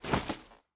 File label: pet armor; smite; lancelot